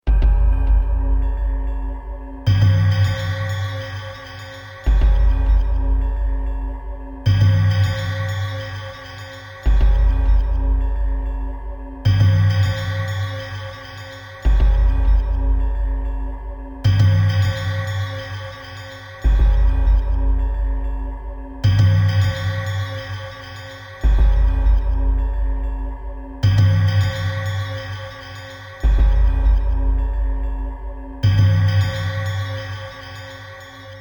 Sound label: Tribal Bells Drums, Eerie looping Ethnic tribal bells and drums; Tribal Ritual; Musical Sound Design